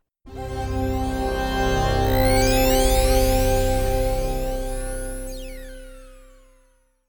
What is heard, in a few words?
sonic logo